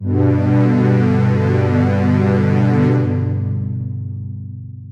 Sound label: Analog Curiously Soft; jup8